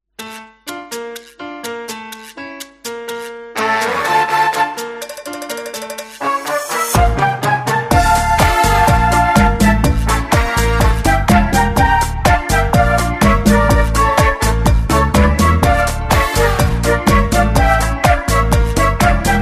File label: cha